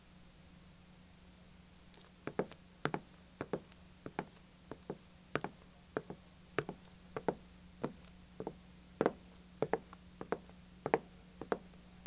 Walking in heels

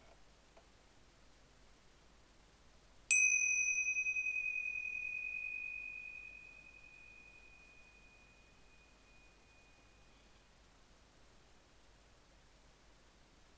Zymbel

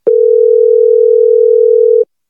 Ringing Phone Call

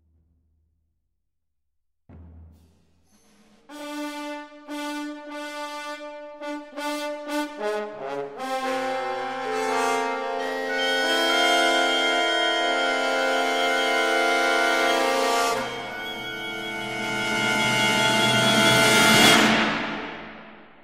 Dramatic Strings Cinematic

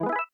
Retro Bonus Pickup

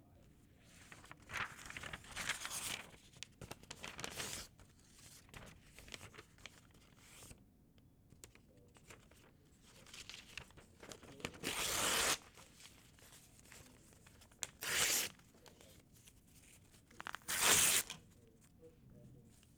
Folding And Ripping Paper

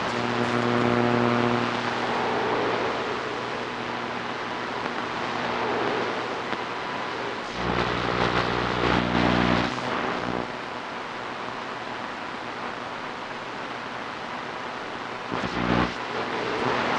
Washy Radio Interference Industrial

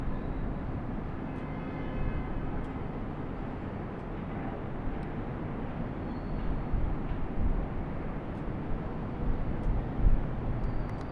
Sound Of A City Far Away

